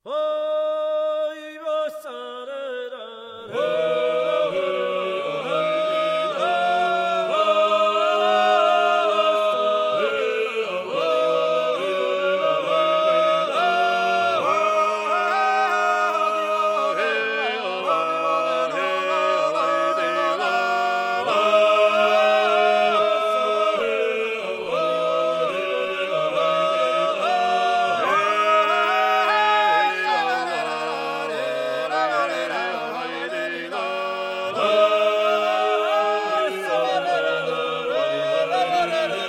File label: georgia achara vosa, polyphony